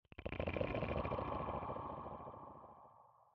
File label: conduit; minecraft 1.21.5; block